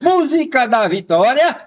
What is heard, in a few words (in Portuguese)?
musica da vitoria